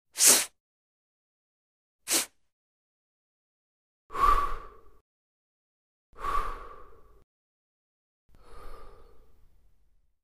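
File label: human blowing nose exhales inhales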